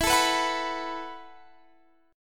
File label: E Suspended 2nd Suspended 4th E, down, Guitar, Standard; strummed; JGuitar